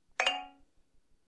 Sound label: notifier; blackberry bold 9700